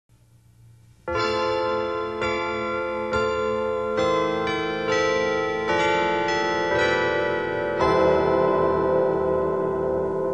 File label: carillon chimes